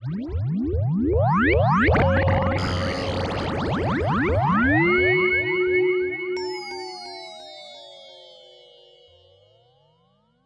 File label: Virus LFO Sweep